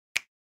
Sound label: snap